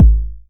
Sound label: 909 BD; Goldbaby Tape808 & Tape909; The Tape 909